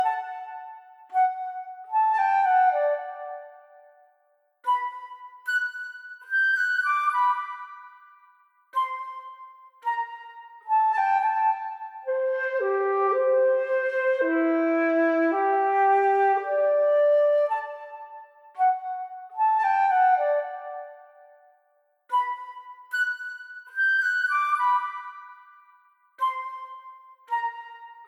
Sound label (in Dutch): Fluit; Toki Tori 2